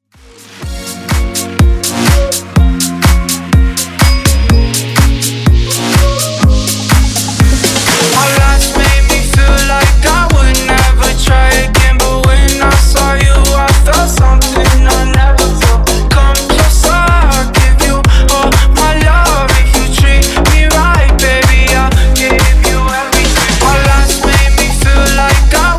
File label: Bounce 124bpm; Bootleg